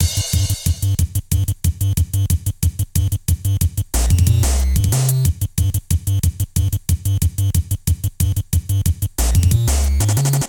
prebattle; Undertale

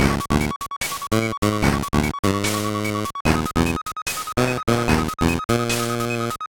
tension; Undertale

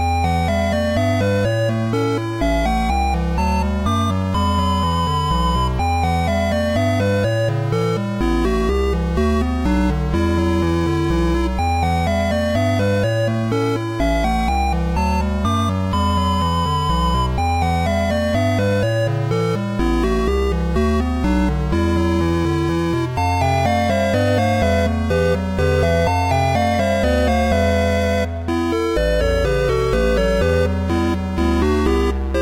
game over; Undertale

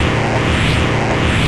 rainbow beam hold; Undertale

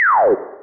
bomb fall; Undertale

